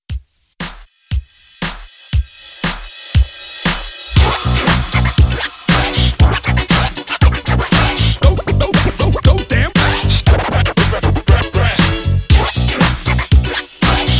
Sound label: Hype Scratch Mashup Re Drum